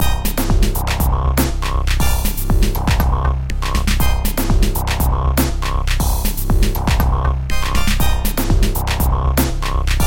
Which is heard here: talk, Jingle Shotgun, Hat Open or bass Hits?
bass Hits